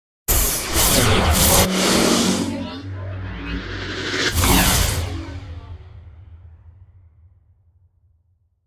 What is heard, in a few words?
Storms Meteor; Gothic Storm Music; Advanced Sound Design 2012